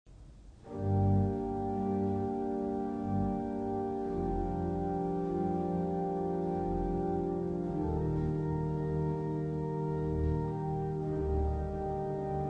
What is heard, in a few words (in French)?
Trompette